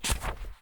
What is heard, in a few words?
U I Passport